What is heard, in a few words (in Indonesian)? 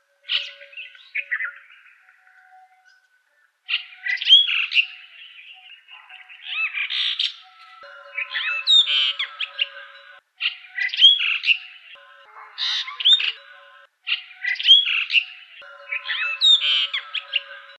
jalak brahmini